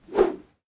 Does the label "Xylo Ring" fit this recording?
No